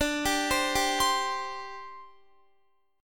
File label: D 7th Suspended 4th, down, Guitar; D7sus4; arpeggiated